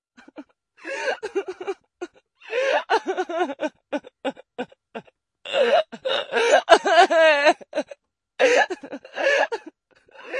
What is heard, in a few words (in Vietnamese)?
tiếng người phụ nữ khóc; tieng con nguoi